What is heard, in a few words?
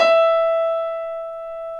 WARM PIANO